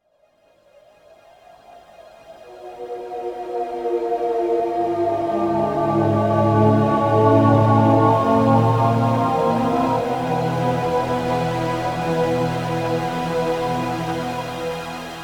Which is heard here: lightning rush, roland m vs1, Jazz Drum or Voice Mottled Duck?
roland m vs1